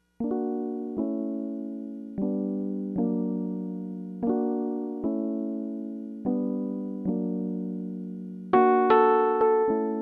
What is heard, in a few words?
distant drums